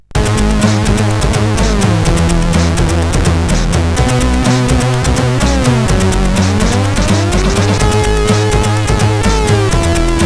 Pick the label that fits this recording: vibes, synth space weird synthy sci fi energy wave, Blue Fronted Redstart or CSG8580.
CSG8580